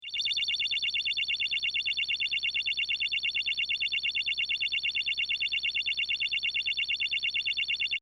Sci Fi Scanner; Sci-Fi Scanner Sound Effect - Perfect for general Science Fiction usage or Multimedia usage; Communication; CraftedSignals

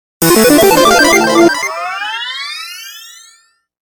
Ascend Warble Laser Delay; 5.1 STEREO MIX; Blastwave

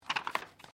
Book Page Heavy Turn; Book movement; Books (Sound Effects:Offices)

